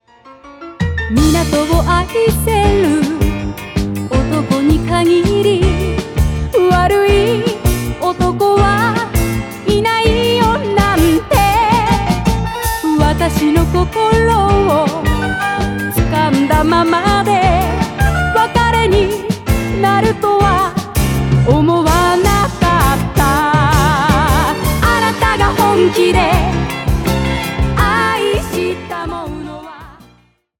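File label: jpop female; laser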